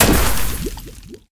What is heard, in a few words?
rain impact; smite; vulcan